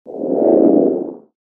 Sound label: shield off